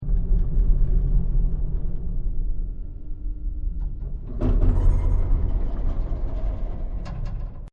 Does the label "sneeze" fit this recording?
No